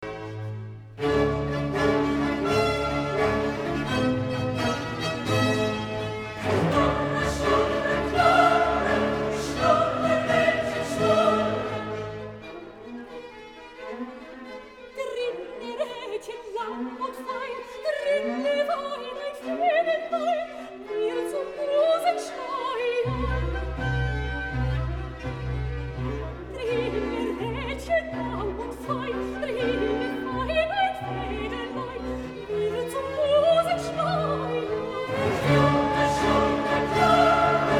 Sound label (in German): Lied mit Chor; Knurre schnur